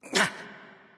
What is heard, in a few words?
Grunt; smite; loki